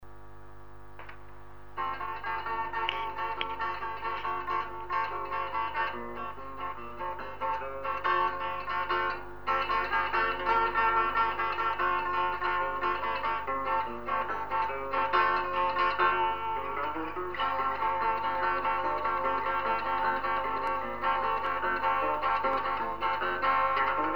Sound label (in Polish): Gitara